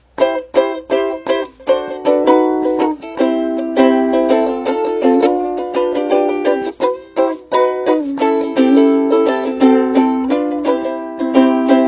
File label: Ukulele